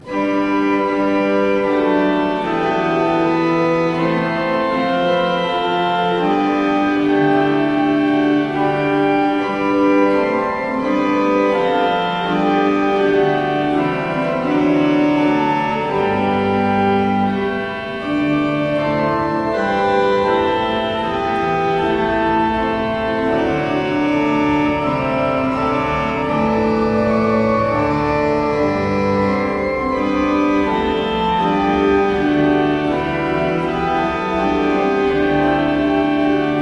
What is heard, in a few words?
tablature psaume 140, orgue; ammerbuch; wenn wir in hocshster noten sein; anthologie musique protestante